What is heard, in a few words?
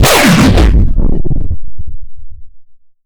Fx Impact Laser; bass house